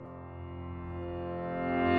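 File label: piano In reverse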